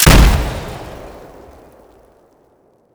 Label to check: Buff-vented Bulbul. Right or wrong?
Wrong